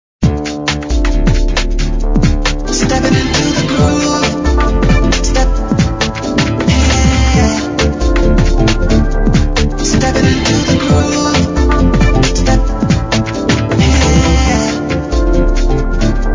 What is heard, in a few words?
Hip Hop Beat